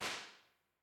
The Beautiful Room, Chandler Drums, impulse responses; Henry Olonga